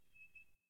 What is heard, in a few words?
Creak; Creak Lit; Puddle